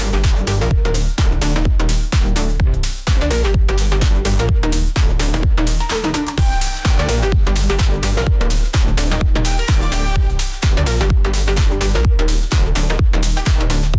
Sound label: TechnoBIT